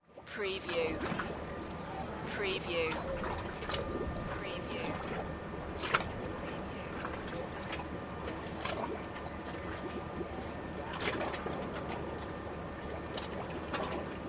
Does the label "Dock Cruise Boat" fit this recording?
Yes